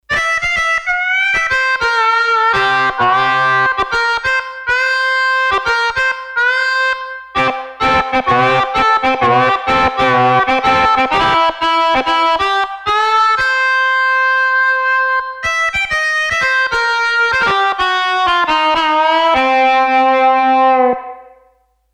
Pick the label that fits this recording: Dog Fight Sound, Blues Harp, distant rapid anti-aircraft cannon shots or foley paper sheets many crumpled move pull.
Blues Harp